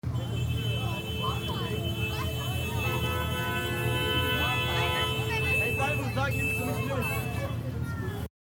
Silvester Hupen Menschen

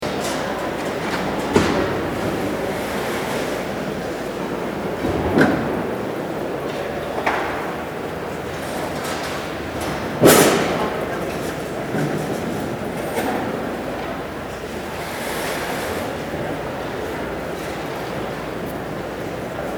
Messehalle Aufbau

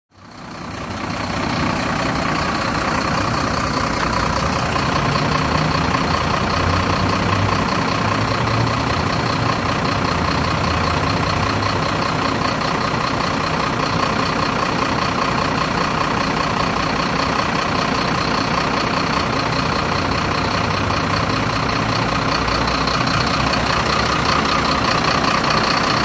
laufender dieselmotor in der city

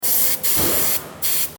Autowerkstatt abpusten

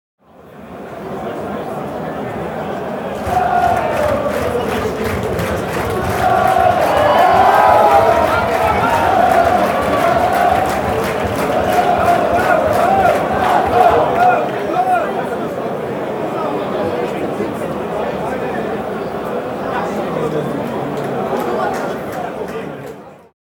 Stadion Fans und Gesaenge

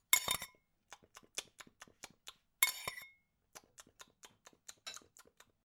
schuessel auskratzen und schmatzen

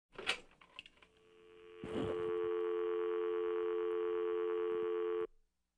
Im Buero Telefonhoerer abnehmen Amtszeichen

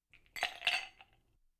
Bei Tisch Eiswuefrel In Glas

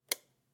Lichtschalter

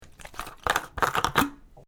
Flasche oeffnen

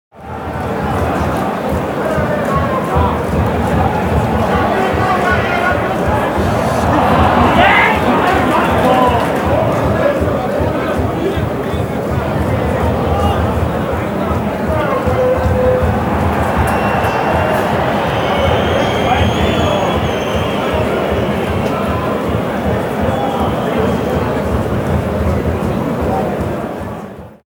Stadion unter der Tribuene

